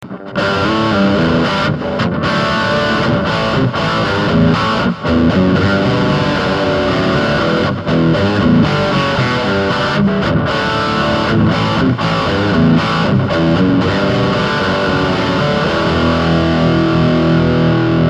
ax crunch dark, guitar sounds

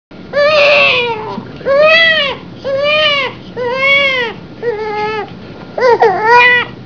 baby cry

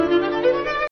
violin

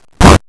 fart

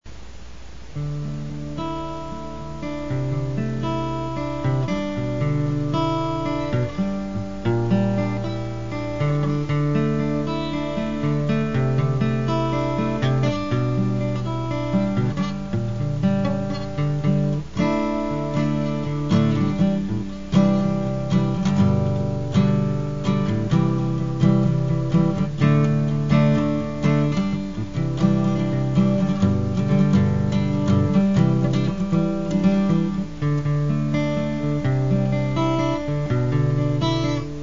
Solo D; Emotional country style Song (Lots of mistakes though)

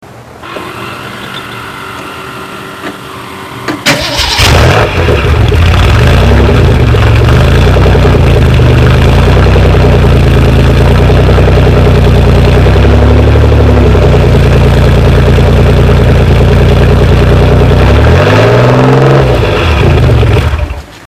Startup

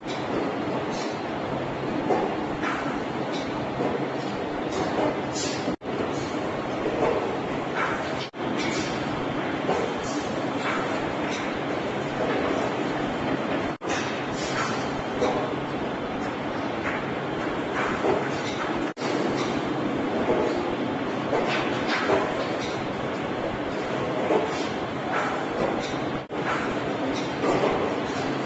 clock

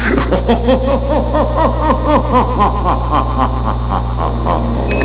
laugh

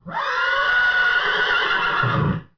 horse whin

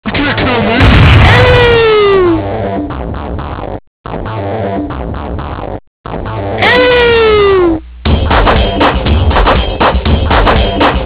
Hoot

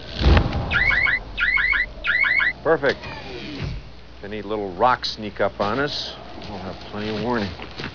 rocks; stargate

